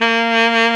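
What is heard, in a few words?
big band fast baritone sax; Roland L-CDC-01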